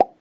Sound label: Percpal; Nnaye; TRUTH-HZ; Crowdsourced Sample Pack